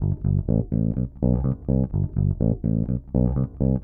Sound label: DEEPHOUSE BASS 125 A